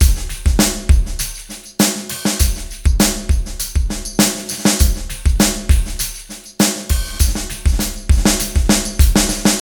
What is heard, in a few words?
ALPHA BEAT 100